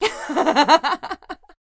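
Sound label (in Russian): вульгарный женский смех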